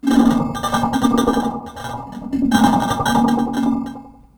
Moog LPF & Delay, contact mic, Tin Of Guitar Strings; musicradar; contact mic madness